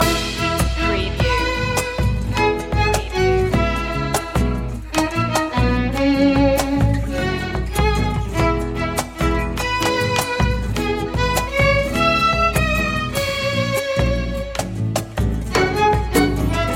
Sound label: celebrating world music loop